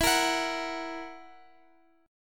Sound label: E Suspended 2nd Flat 5th E down Guitar; strummed; JGuitar